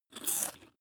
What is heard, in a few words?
bite biscuit